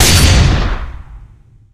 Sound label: shot, weapons; widow maker; tf